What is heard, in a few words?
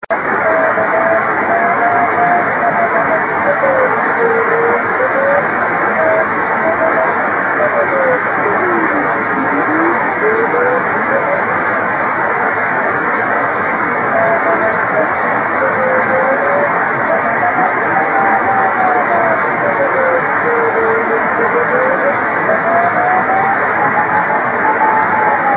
24G beacon; 雨、気温１５℃ 湿度８０％; ANT: 60cm dish 受信：ＤＤ対応機 SSB:RST515 レインスキャッタ SSB:RST519; JH1UGF 横浜市; JN1AYV 茅ヶ崎市